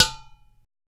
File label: UDU, African